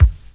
BD, BASS DRUM, 70's Breakbeats

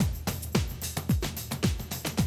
110, Classic Drum Loops

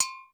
Dance Kit, Korg 01W

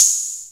OHH, Roland JX-305

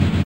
KIK, Drums; Distored Reality 1&2

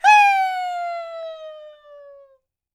YELP, World Voices, ANDES FX

